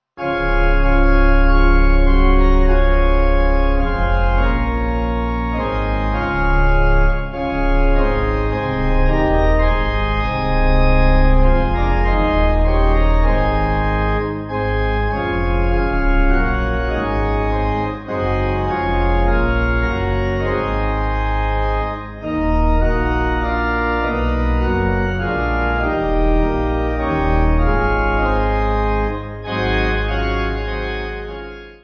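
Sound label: Pipe, pipe organ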